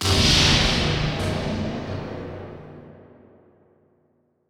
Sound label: EXPLO; Best Service; Advanced Mega Effects